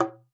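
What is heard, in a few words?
A3, bass flute; Xsample VOL-2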